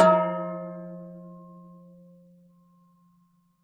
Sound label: low bell; Univers Sons - Raricussions